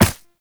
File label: Operator Run Ice, Footsteps; Warframe